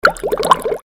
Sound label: bubble